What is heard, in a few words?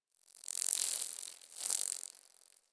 Insect Fly By; Ambience; Misc Outdoor; Warframe; Infested Eris